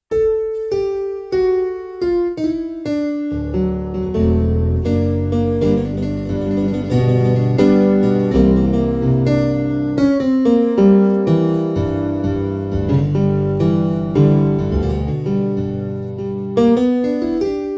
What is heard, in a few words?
pc3 rock